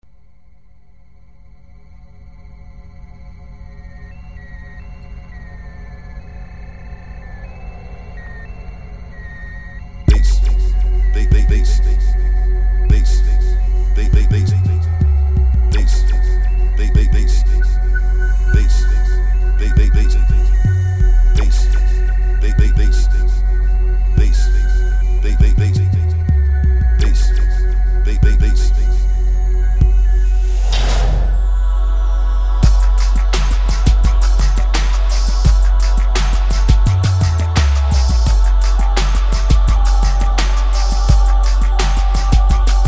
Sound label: Dolby Bass Test